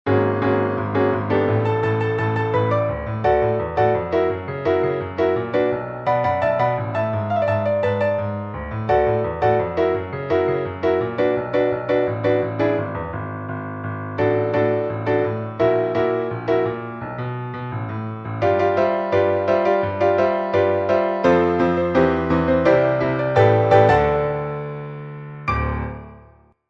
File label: piano accompaniment; audition